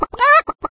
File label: chicken hit; There; ThereClient